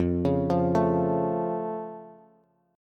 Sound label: F Augmented F Bass; arpeggiated